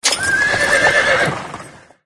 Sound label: City Stable; the great ottomans strategy battle for throne android